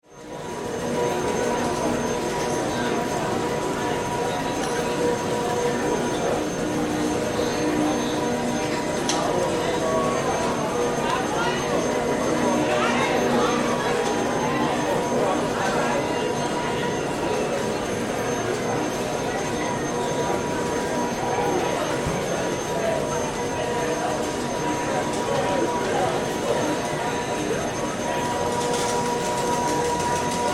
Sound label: Ambience Casino Crowd Walla Slot Machines